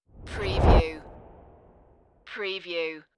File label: Rush